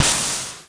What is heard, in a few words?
punk attack